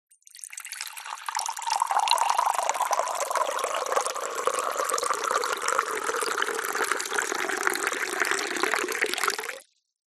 pour water into mug from kettle; food drink

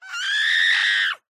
pain scream scared extreme hysteric

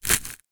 salt dispenser turn grind; zapsplat

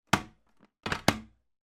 household first aid kit box plastic close

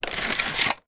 household vacuum metal hose arm remove; sound effects one

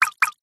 multimedia game sound water drip double

